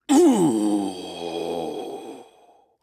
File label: Shield Sentry Death